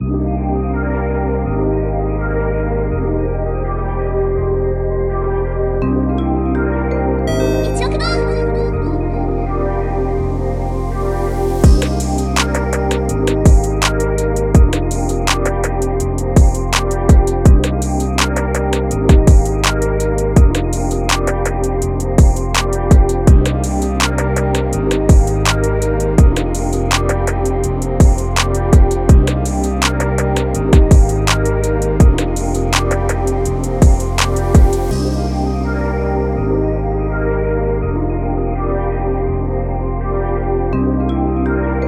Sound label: Cm BPM165